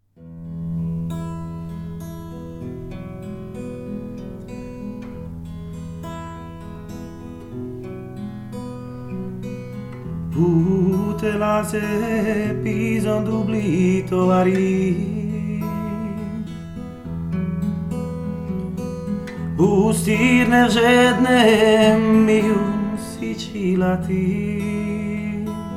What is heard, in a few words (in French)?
Chanson Dalmatino